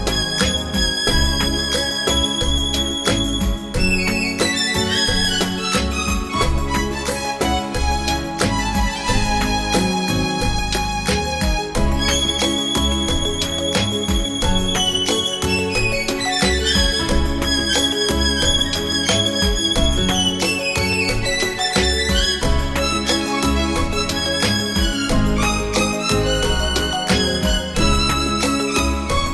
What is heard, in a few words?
Latin Bolero